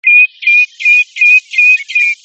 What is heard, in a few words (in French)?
Chevalier Gambette; Scolopacides; Le Chevalier Gambette; Oisellerie Galan